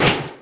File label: sound break block; replicaisland; android 6.0.1